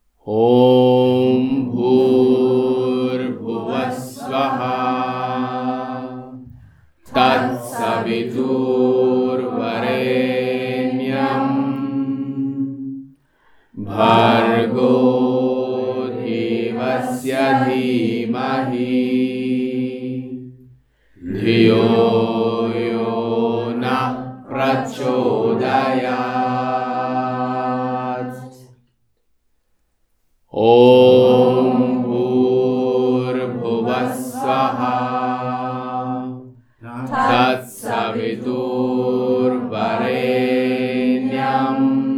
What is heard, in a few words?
Om Bhur Bhuvah Svah